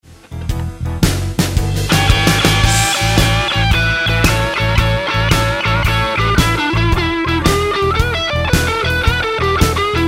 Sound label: Roadhouse Licks Lick, guitar; 20 Roadhouse Licks